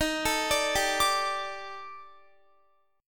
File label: Eb Major 7th Flat 5th Eb Guitar 4E 4A 5D 5G 5C 6F; EbM7b5; arpeggiated